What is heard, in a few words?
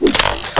plasma rifle